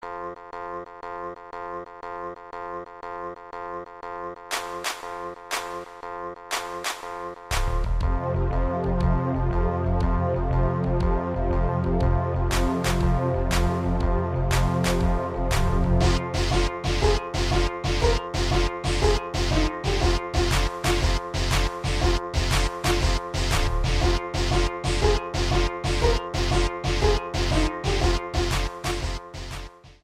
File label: Shuffle